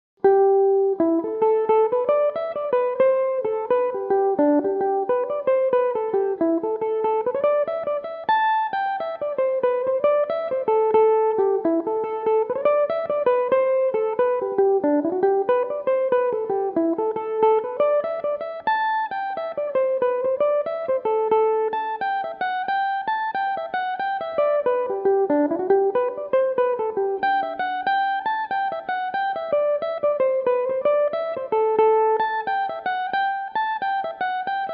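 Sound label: jig a dorian, mandolin; Old Man Dillon; Belmuse EM-190 electric mandolin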